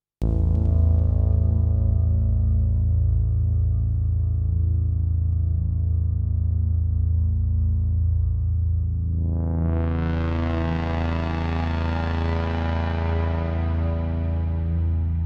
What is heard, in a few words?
Caves